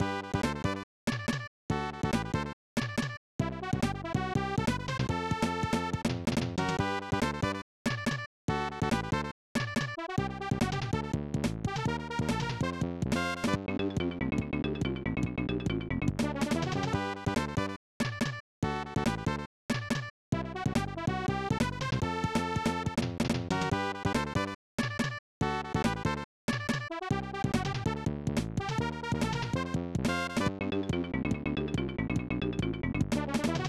Race Lost; Hashire Hebereke; SNES

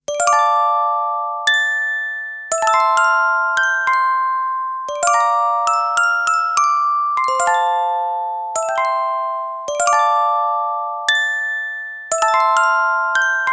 Orgel; ape escape 3; Blue's Orgel

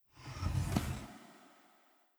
slide

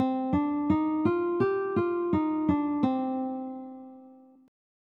Playing Scales; betrayal at krondor

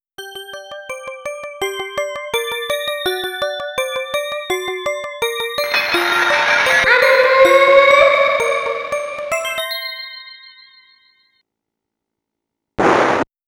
Rasuto Shiin (with VOICE); Namco Sound Museum from X68000

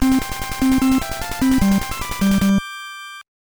Perfect Fanfare; Snoopy's Silly Sports Spectacular; NES

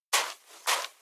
Footsteps Sand; Idle Champions of the Forgotten Realms

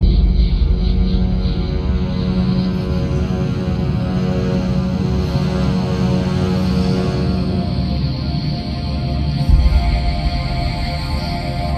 Tension No Synth; Strike Suit Zero

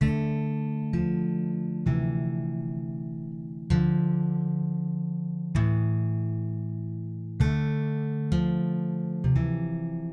In memory of the dead [Guitar]; Next Day Survival

